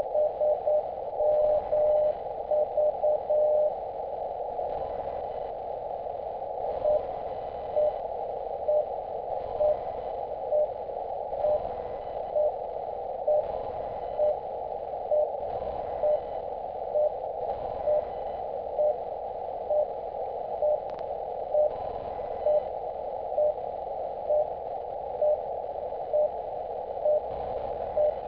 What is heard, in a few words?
144318 mhz